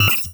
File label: Beep Evolver; Adobe; Multimedia Internet CD-Rom Flash